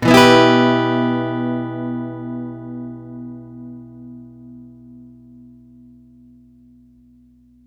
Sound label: One Shot Guitar - 125 BPM (B Minor), Acoustic Guitar; Instruments; One Shots; GhostHack; Ghosthack - Free Guitar And Piano Samples